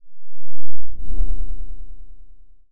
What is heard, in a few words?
Low Frequency Whoosh